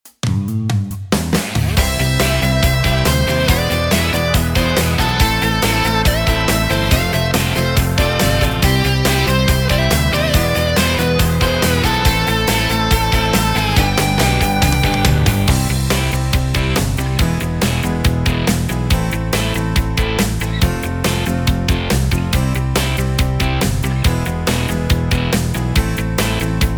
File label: Style Yamaha; yamaha psr s970